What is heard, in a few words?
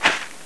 player grass